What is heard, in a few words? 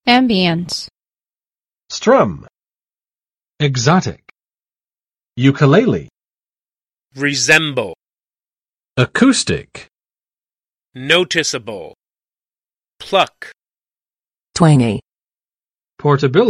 ambience, The Tropical Sound of the Ukulele; 乌克丽丽的夏威夷风情